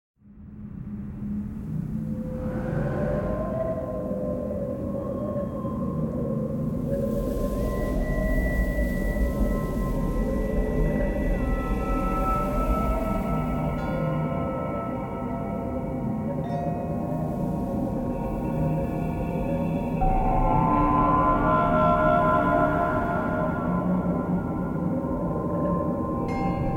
Room 3 Ambiente

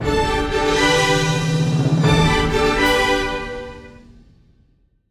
Fanfarria; Militant

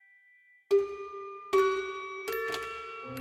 Pollo loop INTRO

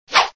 Cartoon Soga Lanza; Fernanfloo Saw Game Online

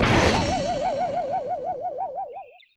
Recibe Golpe Fuerte; el chavo una feria en la vecindad; ios mobile gamerip 2012

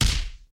Golpe; el chavo una feria en la vecindad; ios mobile gamerip 2012

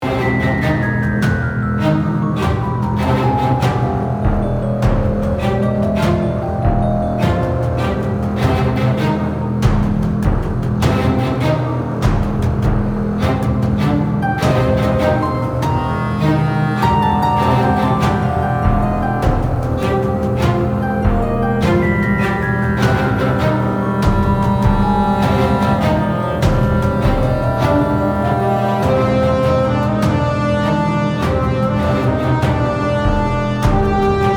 Humanos Exploracion Variacion

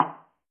Madera; el chavo una feria en la vecindad; ios mobile gamerip 2012

